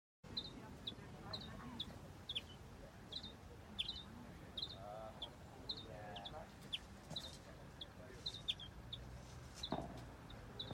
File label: Mystery bird call